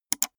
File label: gaming mouse left click single fast